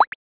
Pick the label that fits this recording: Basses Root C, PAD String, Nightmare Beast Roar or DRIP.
DRIP